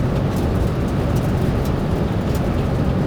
INT RODADA LLUVIA; AOS F1 2022

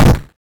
IMPACTO CONO; AOS F1 2022

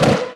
V6 EXT SUBE MARCHA; AOS F1 2022

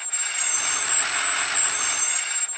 INT FRENOS; AOS F1 2022

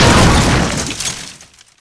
impacto muro medio; AOS F1 2022

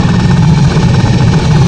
GEN CONTROL TRACCION; AOS F1 2022

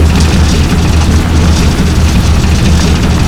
INT RODADA HIERBA; AOS F1 2022

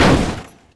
IMPACTO COCHE LEVE; AOS F1 2022

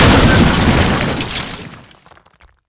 IMPACTO MURO ALTO; AOS F1 2022

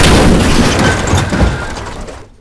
IMPACTO COCHE GRAVE; AOS F1 2022

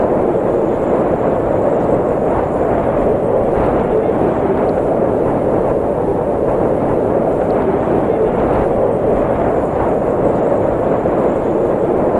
GEN VIENTO; AOS F1 2022

